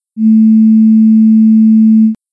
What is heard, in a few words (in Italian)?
sinusoide 200Hz